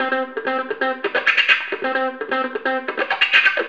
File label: GUITARS 130, House